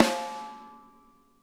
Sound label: SNARE, Drum Kits